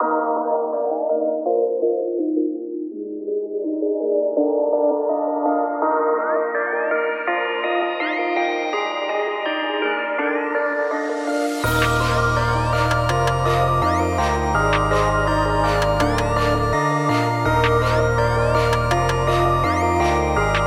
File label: B♭M BPM165